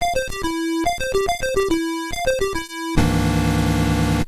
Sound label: coin up